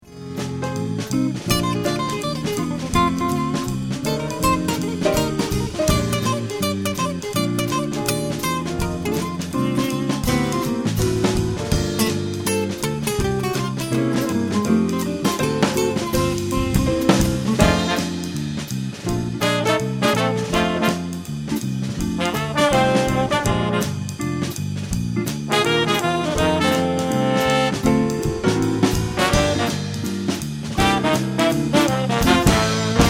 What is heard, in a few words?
Jazz, Bass Drums